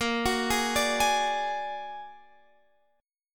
Bb Augmented 7th Bb down Guitar Half Step Down; Bb+7; arpeggiated

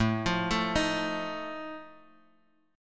F 7th A

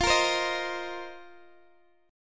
F 7th Suspended 4th, Guitar, DADGAD; x,x,15,15,15,13

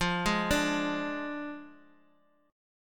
F Minor 6th F down; Fm6; arpeggiated; JGuitar

